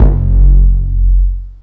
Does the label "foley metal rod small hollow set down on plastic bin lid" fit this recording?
No